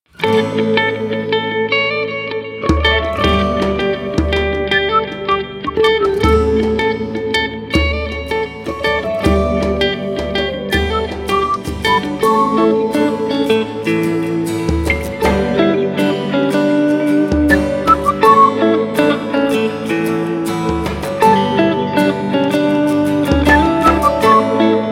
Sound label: Seagull, ringtones; Coolpad; Dazen-X7